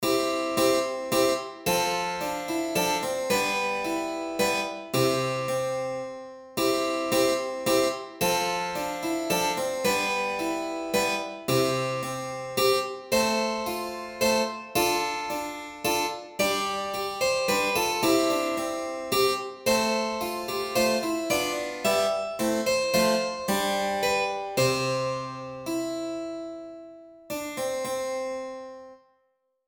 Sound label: ave maria equal